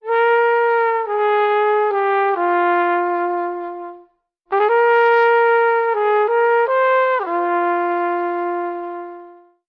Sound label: horns funk; Sad; Loops